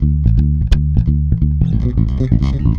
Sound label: SCUFFLE, Bass, 174 BPM; Sampleheads; Ultra Freakin' Bass